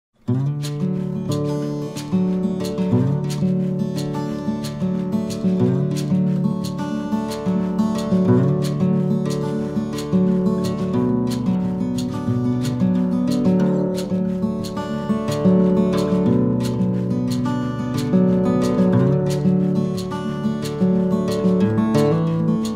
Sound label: western